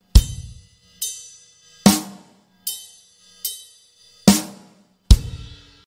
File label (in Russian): zvuk barabanov